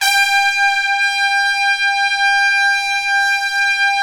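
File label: BRS, Trumpets Section 3 f; Roland L-CDX-03 Disk 2; BRS Tpts Sec.2-3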